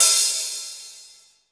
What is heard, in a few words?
ELE. HH3 BOP, HI-HATS